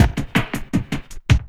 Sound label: LOOP, 160 BPM, Drum & Bass